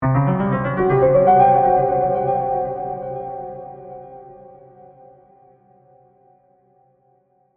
Musical Piano, Horror, Musical, Piano, Accent, Dark, Eerie, Ascend; Scary Soundscape; BlastWave